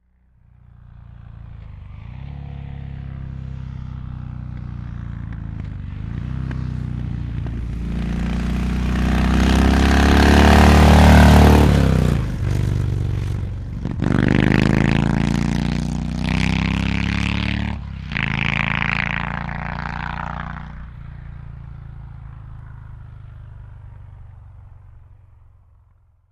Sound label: Harley Motorcycle, harley davidson ext bys